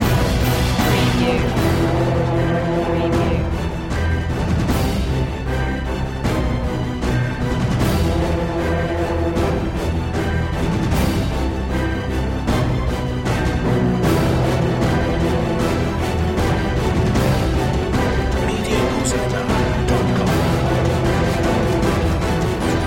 no choir

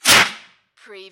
Fire Extinguisher Short

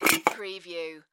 Can Pick Up Aluminum

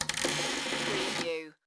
Creak Short

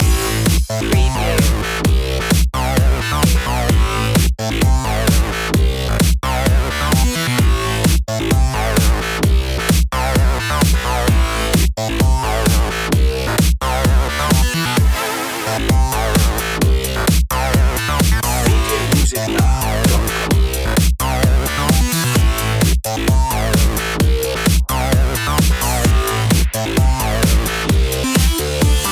flying chords loop

